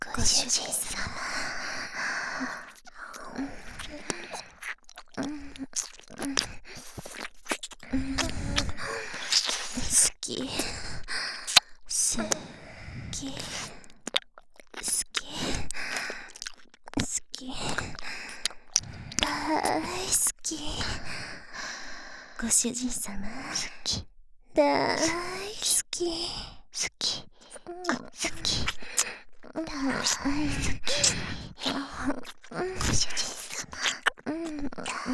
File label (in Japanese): L R スキ × 耳舐め, バイノーラル; 莉桜; ハーレムオナサポ 4人のメイドがご主人様のオナニーをお手伝いするバイノーラル～文、千曜、名月、莉桜～